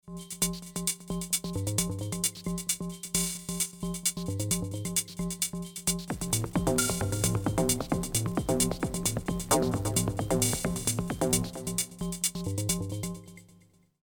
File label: digitone vocal whisperers